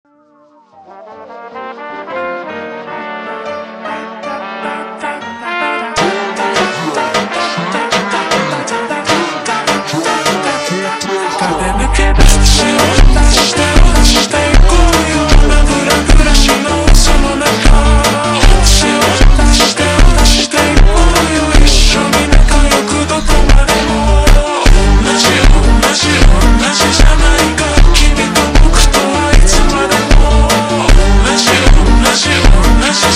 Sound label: Funk ultra Slowed